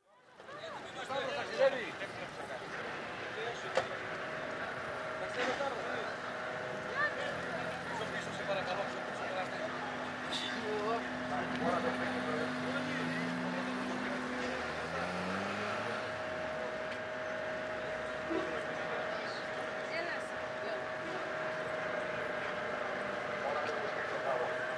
Greece: Port, cars & lorries loaded onto ferry, doors shut, PA announcement on board, ferry leaves & blows horn (Recorded on shore); Boat Sound Effects; BBC